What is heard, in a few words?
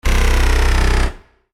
magical; maoudamashii